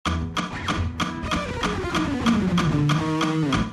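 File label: economy picking fast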